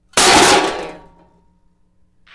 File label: Metal Debris Impact Settle